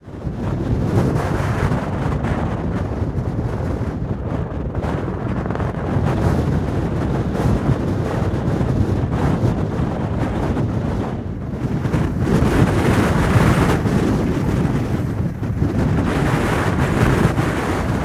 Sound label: Golden gate bridge Bridge wind